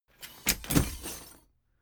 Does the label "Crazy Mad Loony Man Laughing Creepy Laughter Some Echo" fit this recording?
No